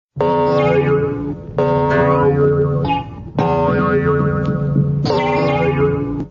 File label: Boying 3D